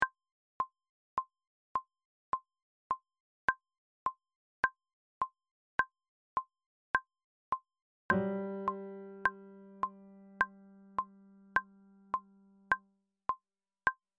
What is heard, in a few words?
ts and mallets; cuban at tempo; band resources